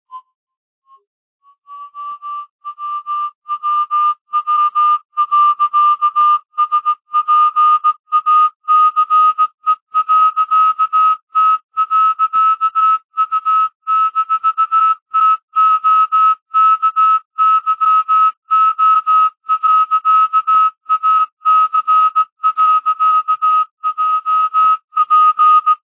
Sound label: co-57, oldsatellite; xi-iv; 6:45pm Pass Sample with dsp; I-Com IC-R20; cw Telemetry channel came down really strong